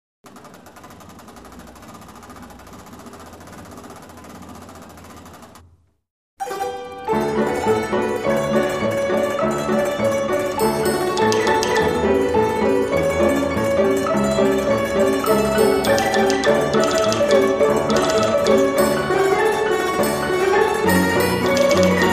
Strassenklavier; Italien; Pomella Pietro; klingende kostbarkeiten mechanischer musik

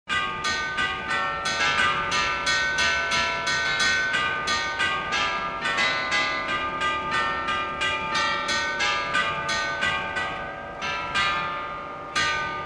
Auf Der Heide Glocken, draaiorgelmuziek; orgelball bei barth

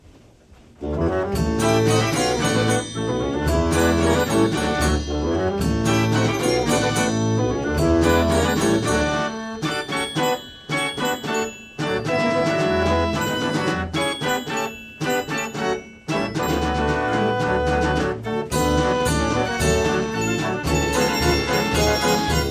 karussellorgel; gebr bruder modell 109

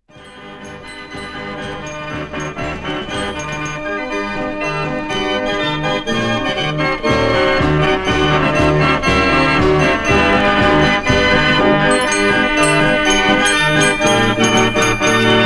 Der Lustige Kupferschmied, draaiorgel; de grote wellerhaus alkmaar

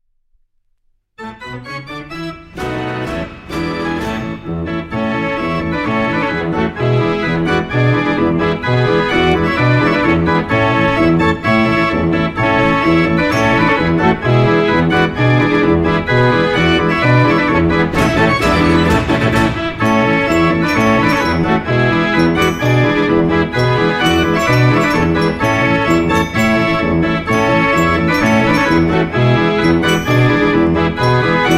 Kaerntner Liedermarsch, konzertorgel; 34er ruth sohn konzertorgel; orgel harmonien

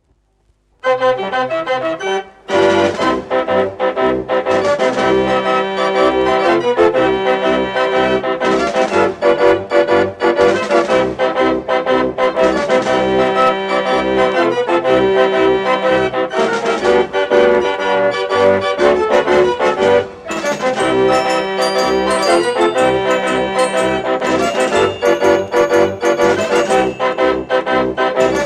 Marsch, orgel; oktoberfest orgel; Frei

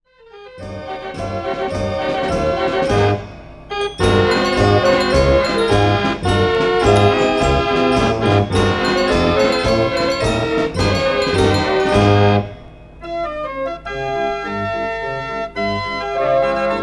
freimarktorgel; bremer freimarkt orgel; rickes freimarktorgel; rickes beliebte bremer freimarkt orgel

